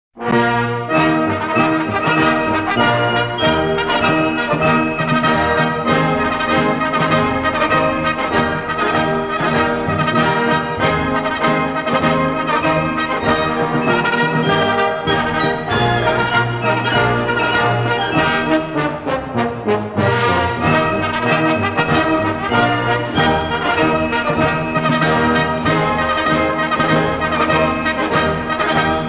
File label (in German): militarmarsche hohenfriedberger marsch armeemarsch; deutsche militarmarsche; nr 10